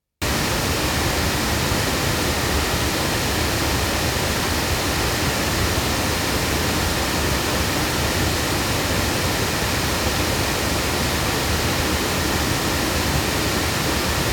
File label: loudspeakers; CT Soundstage Image; microphones; Crowley Tripp